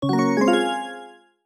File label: start; snake